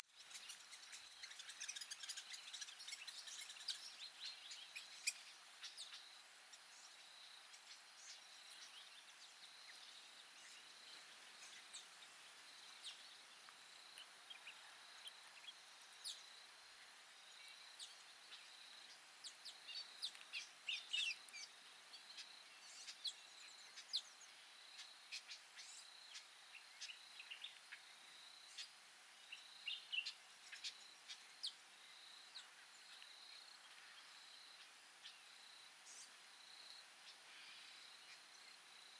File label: Orange-weaver, پرنده جولای نارنجی; صدای پرنده جولای نارنجی